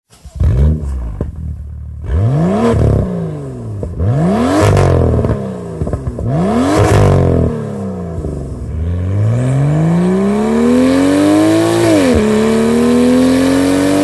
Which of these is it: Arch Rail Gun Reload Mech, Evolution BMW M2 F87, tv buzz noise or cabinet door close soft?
Evolution BMW M2 F87